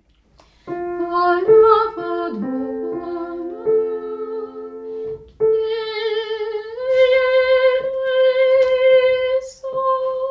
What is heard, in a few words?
Kyrie Tenor